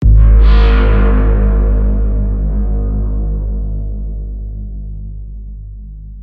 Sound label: morphed metal discharged cinematic trailer